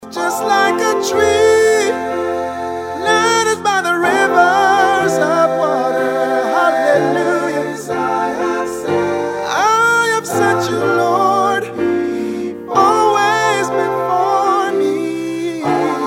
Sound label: ring tone